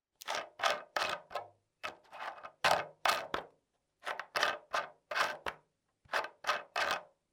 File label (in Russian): zvuk kryshki